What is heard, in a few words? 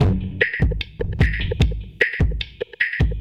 Beat, Futurist Drum & Bass; Drums & Percussion